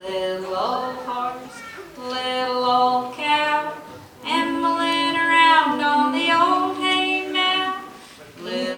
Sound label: musicbox